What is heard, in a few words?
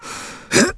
pull up; Shadow Man